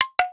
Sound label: sleep